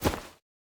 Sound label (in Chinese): 收纳袋插入声